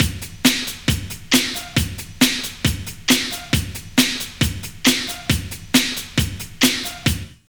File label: LOOP, Tekno Industrial; East West